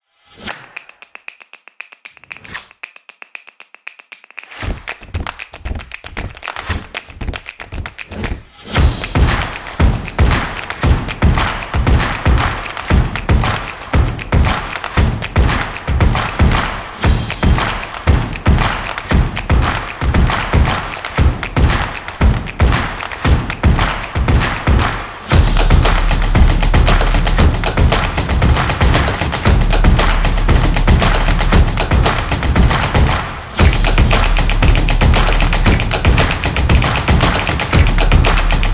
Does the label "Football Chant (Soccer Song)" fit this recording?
No